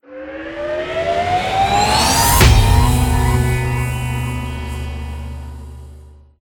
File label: Transition; Everspace 2